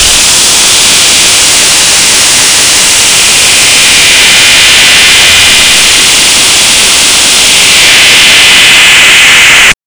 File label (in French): son de globules blancs, biological